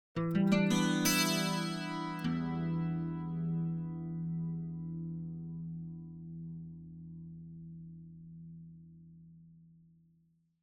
Acoustic Guitar - Arpeggio - E Minor Chorus; Hollywood Edge; sound designer's toolkit v2

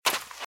Footsteps Sand Dry Run Right; RadwinIsland

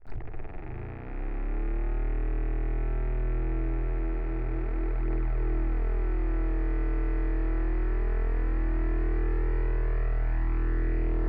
minifan; devices accessories utilities; NS Studios - ultra sound collection

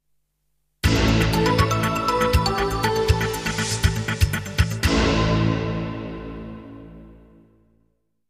jingles; 90 DDD Jingles

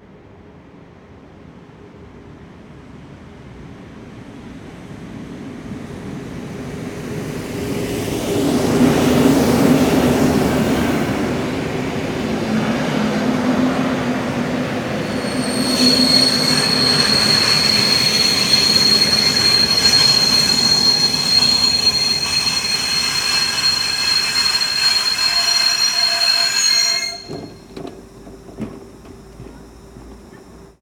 train stop; best service Blue Box

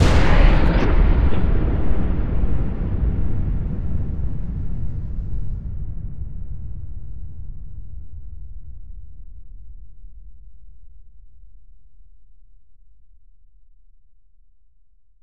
STING thunder slam; Cinematic Metal Impacts

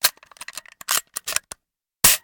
Gun, Pellet, Load And Shoot; gun and weapon sounds; captain audio; fully loaded

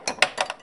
Pinball Ball Hit Rail

